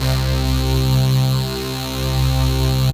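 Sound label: Rave Synth, Dance Mega Jungle Rave; Best Service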